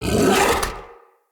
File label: Battle Dog Attack Bite, Animals; Vocals; Snc Battle Dog